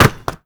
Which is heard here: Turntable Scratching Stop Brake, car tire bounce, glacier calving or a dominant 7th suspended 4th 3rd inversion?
car tire bounce